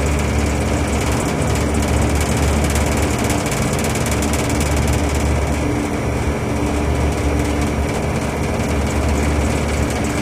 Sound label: ac vent, ambient; Source Engine; tf; lair